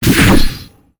weapon dagger shadow step

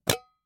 helmet pickup

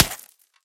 stone bullet hit

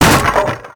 obstacle destroyed wood

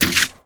weapon bow arrow shot

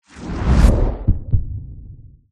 ability stim

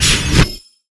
wand teleport

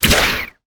weapon bow arrow shot strong

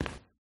footstep brick

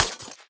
glass bullet hit

